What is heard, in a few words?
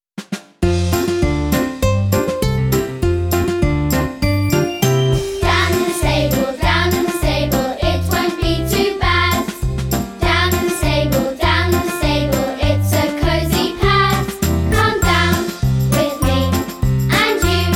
Vocal